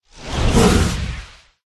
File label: rhino buff